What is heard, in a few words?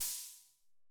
808 Cymbal; hydrogen drum samples